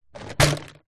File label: office felt tip pens pencils other stationery in plastic tub set down soft surface; zapsplat